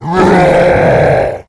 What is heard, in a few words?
demon